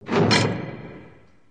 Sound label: door close jail